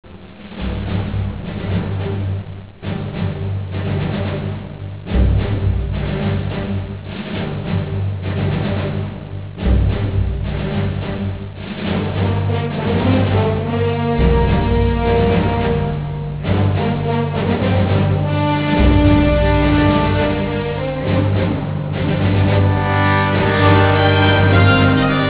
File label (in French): militaire